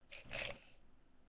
Grabbing Pencils; Learn to Fly 2

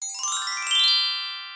Background

